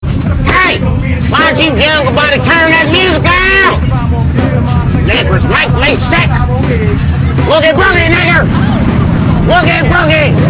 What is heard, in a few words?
music